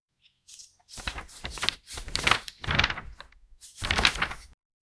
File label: book page turning; document